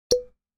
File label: Cork pop